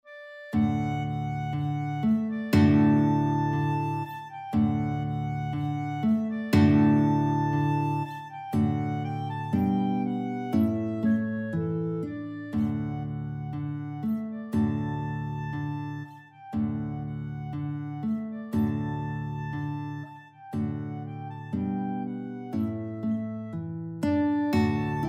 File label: spring, guitar clarinet duet